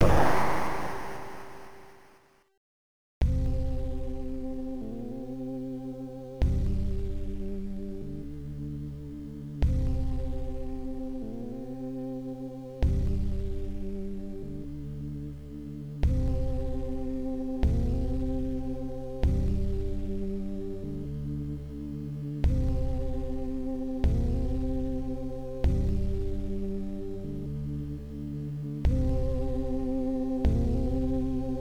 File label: Ceremony; Alternate Version 1; Illusion City; MSX